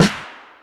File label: Snares